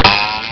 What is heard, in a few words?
shoot